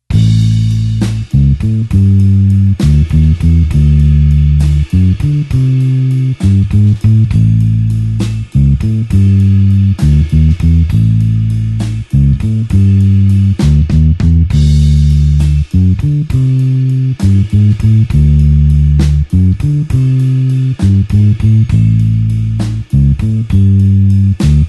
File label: blues bassline slow A